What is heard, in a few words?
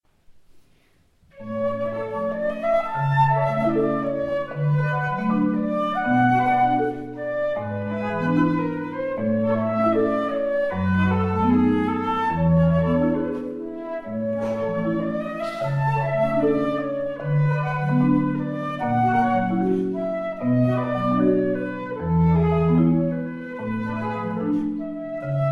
marimba flutes; Mendelssohn Chanson du printemps